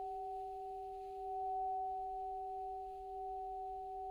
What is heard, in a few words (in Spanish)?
Multiarmonicos